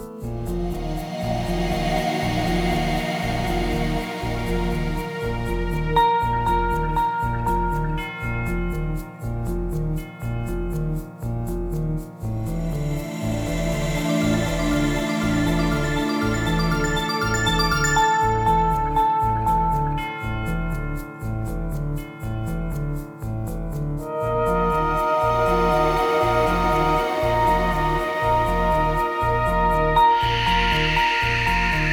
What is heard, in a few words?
The Calm; RPG Maker MV